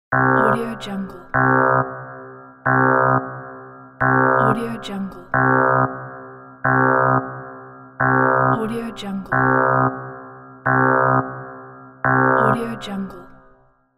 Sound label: alarm